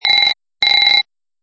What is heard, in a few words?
Tom